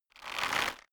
bow load

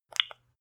landline click

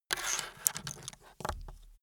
pencil pickup

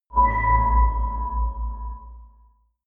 sci-fi notification popup

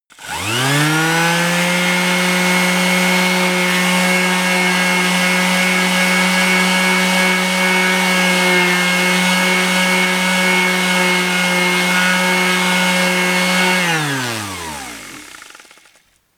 power tool long

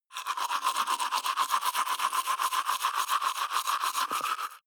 teeth brush wet open molars

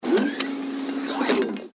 electro hydraulic lock

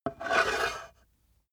metal scrape on wood

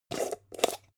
jar plastic midsize open short

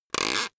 plastic pressured highly

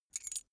key ring small put down

